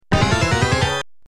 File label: Death; Shadow Dancer